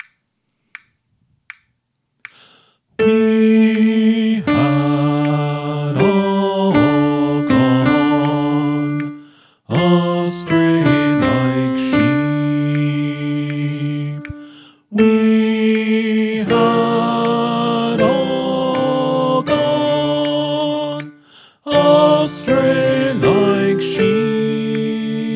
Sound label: Song Refrain Soprano